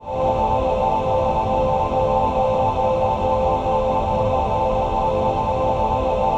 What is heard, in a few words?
VOICEPAD; Best Service; XX Large Pads; Sample CDs